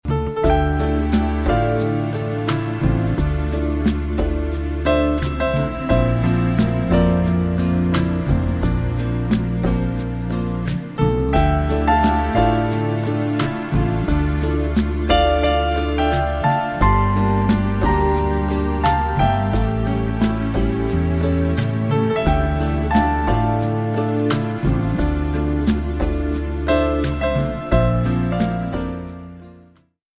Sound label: slow dance